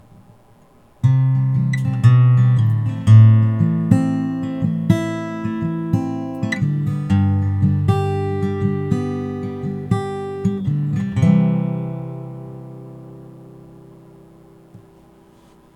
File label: Instrumental Break Sad; Copper Camp Audio Book